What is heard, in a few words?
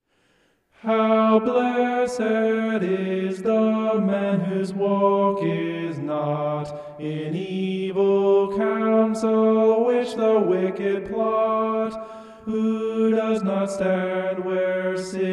vocal homoph; psalm 1